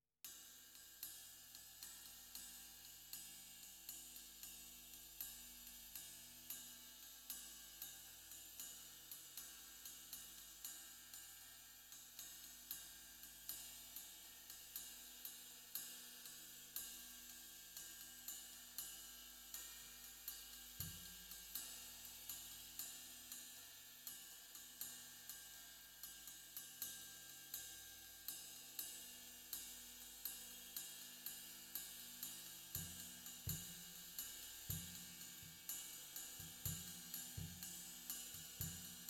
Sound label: Floor